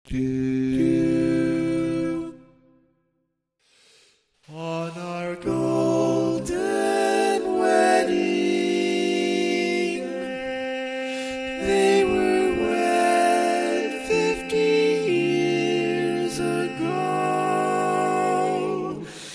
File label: Tenor, barbershop tags